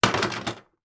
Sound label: game pirate figure put in box; pop up pirate